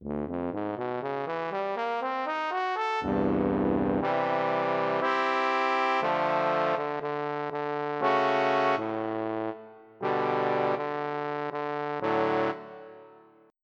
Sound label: Trombone; FF7